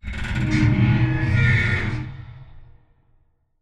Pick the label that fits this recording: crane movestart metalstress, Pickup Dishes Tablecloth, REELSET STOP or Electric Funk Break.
crane movestart metalstress